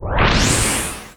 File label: Enemy Iceberg Active One Arm Motion